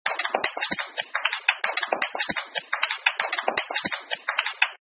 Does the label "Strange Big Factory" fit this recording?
No